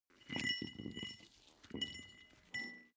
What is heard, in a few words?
small metal rolling